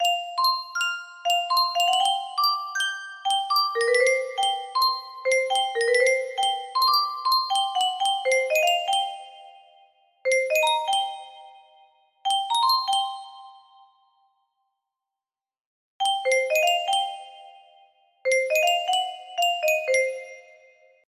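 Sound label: music box melody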